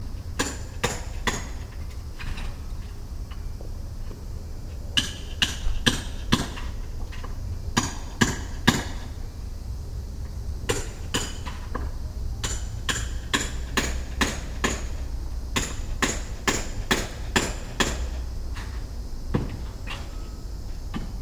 refitting in summer

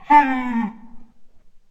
devil scared

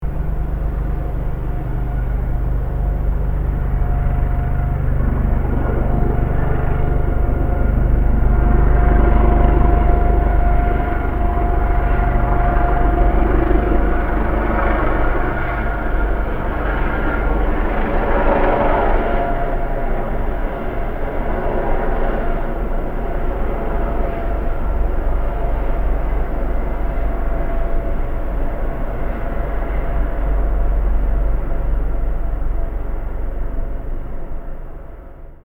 helicopter

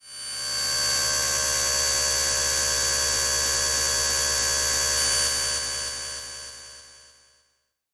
erasing magic

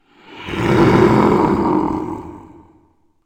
monster roaning

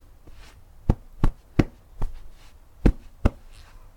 towel

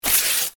tear paper

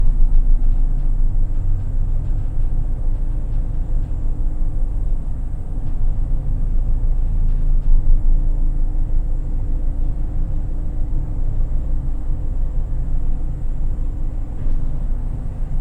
slope car

